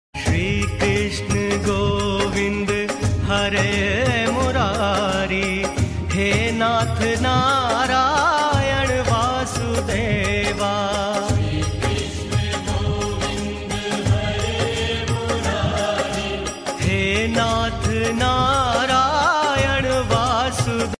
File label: Bhajan Song Ringtone